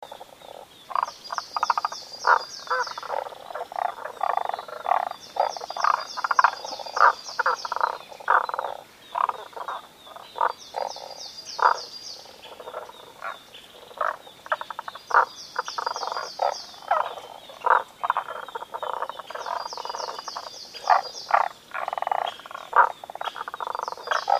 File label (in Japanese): kaeru